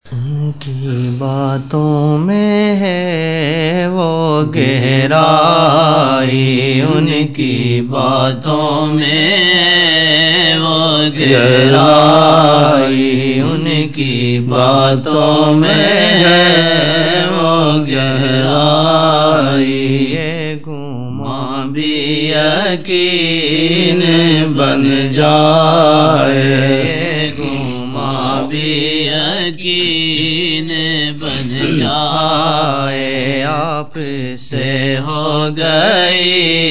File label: naat; aap saw say ho gai shanasai